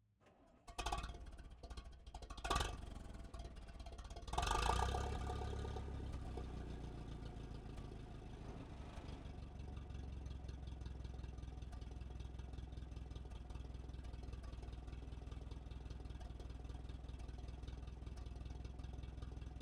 R35 Renault, Onbrd, Start, Medium, Drive, Stop, Off, Exhaust; RE20; Fishing Rod